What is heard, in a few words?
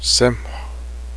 boat